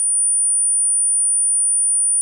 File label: high pitch